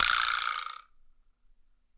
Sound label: VIBRASLAP; PlatinumPhattCD2; E-MU Producer Series Vol. 8 – Platinum Phatt; Flexis Etc